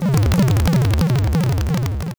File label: game over; cirquit